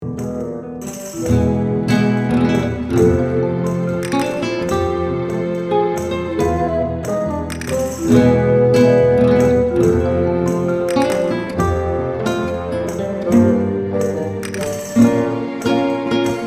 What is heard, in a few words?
Calm - Combat; psychonauts; Black Velvetopian Streets